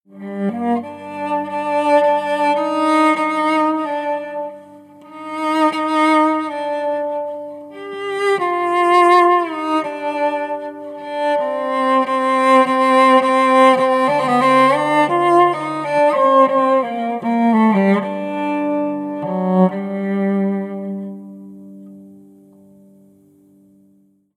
electric cello classic sequence

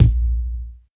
large room kick drum sound a sharp key

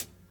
tamed rock hihat sample B key; hat samples

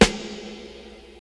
long room reverb snare sample c key

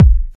fluffy kick drum one shot A key; kick drum samples

